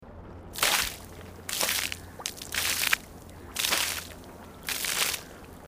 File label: splash splash